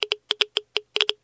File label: geiger; STALKER; IWP